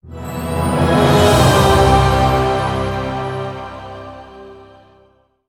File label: Jingle Success; Jack Keane